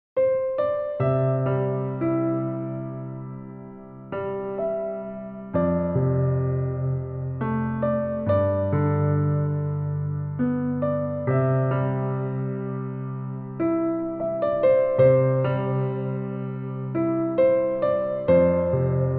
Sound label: Piano Improv; Alleluia